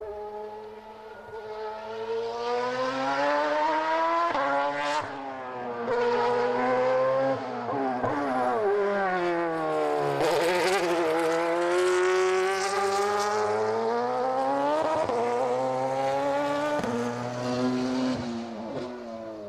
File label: Porsche Rs Spyder